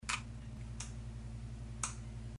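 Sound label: smena vysoty lezviya, blade height change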